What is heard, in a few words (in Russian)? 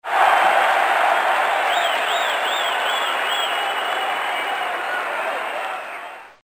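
реакция толпы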